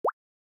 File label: Water Drop Notification Night; Xiaomi Redmi-Note-12-4G